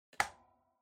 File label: Electric Fan Switch Swivel